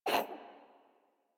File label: Kitten Cough Hairball; Enemies; Warframe; Catbrow